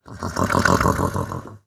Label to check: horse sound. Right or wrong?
Right